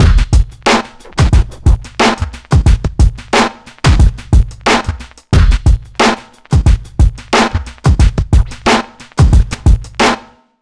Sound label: kit 090bpm; Zero-G; Downbeat Dance Grooves